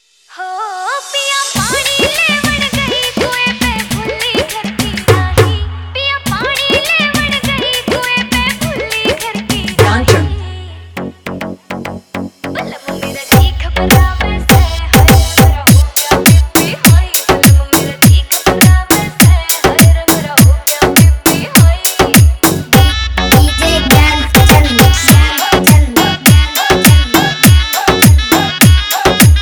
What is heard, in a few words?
Haryanvi Remix